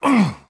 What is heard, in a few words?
Grunt